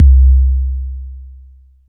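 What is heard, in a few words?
JUNGLE 808 kick; Drums In Your FACE!; BONUSKIK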